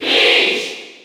Cheer French; Peach; SSB4

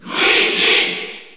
Cheer French; Luigi; SSBU

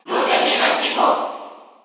Cheer Korean; Rosalina & Luma; SSBU

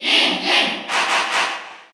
Cheer Russian; Inkling; SSBU

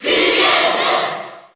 Cheer Japanese; Cloud; SSBU

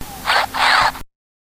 Wiimote Sound

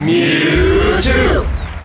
Cheer; Mewtwo; Melee

